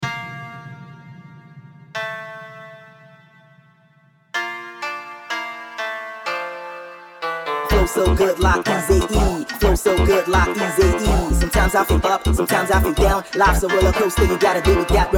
brazilian funk sped up

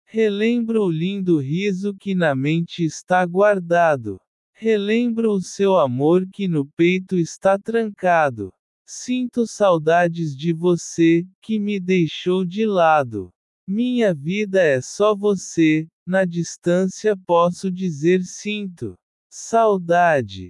scream

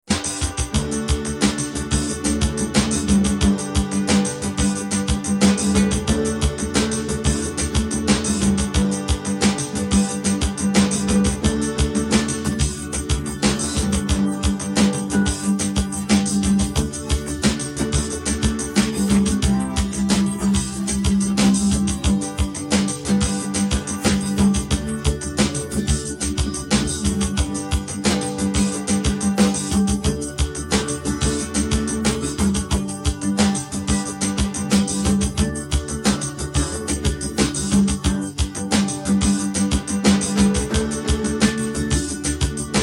instrumental; country

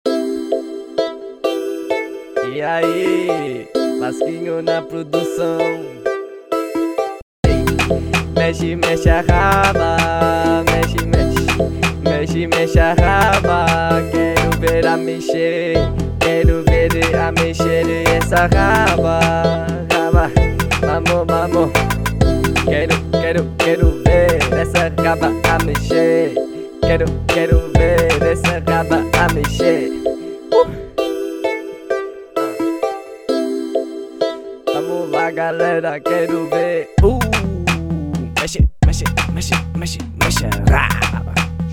beat funk; Beat Funk 1

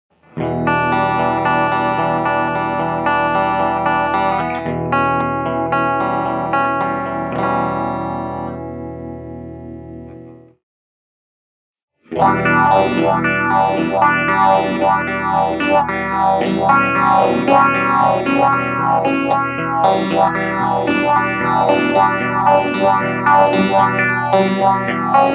crazy phaser; Crazy Phaser 1; Star Dust Pedals

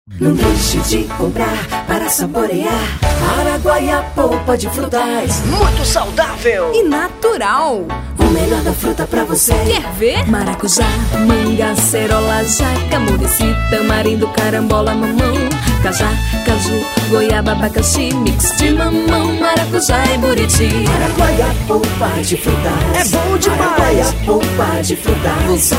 jingle; jingle institucional araguaia polpa de frutas

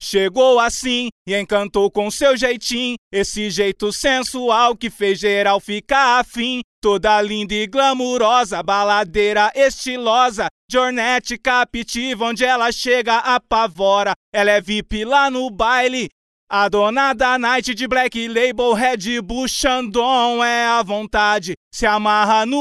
acapella

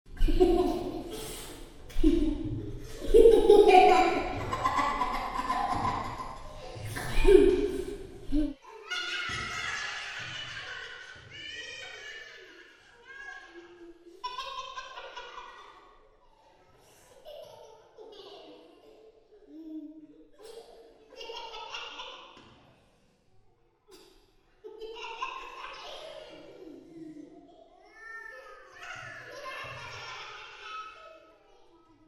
very creepy ghost children laughing sound effect

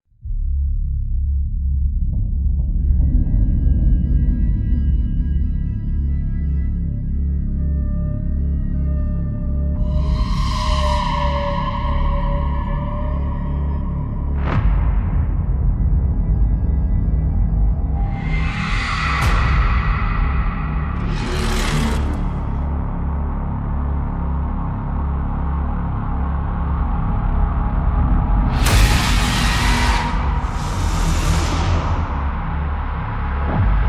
horror film score with escalating intensity

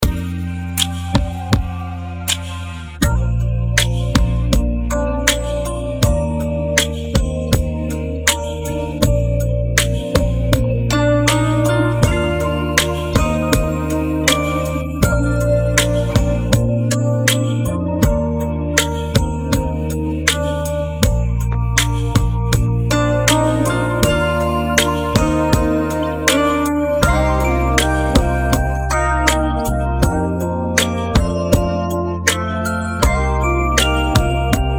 instrumental

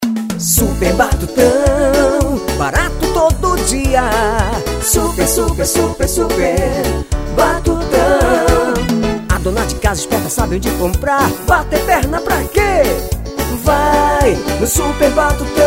jingle

